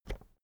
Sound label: keyboard external enter push